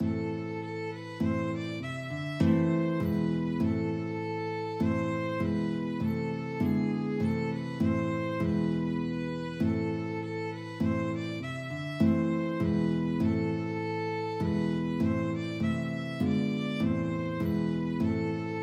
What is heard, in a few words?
guitar violin, duet; aberystwyth